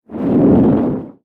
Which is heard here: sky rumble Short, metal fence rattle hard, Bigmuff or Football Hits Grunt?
sky rumble Short